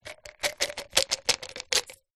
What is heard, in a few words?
medicine vitamin c tablets plastic jar shake tablets into hand